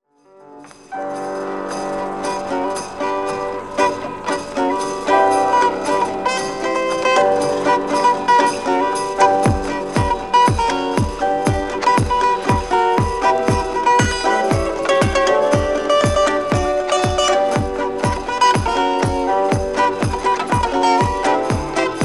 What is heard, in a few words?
WALTZ